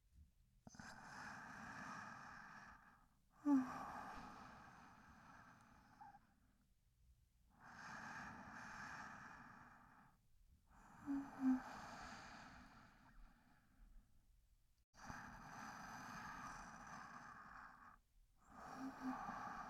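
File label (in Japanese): 寝息ループ（バイノーラルマイク）, ASMR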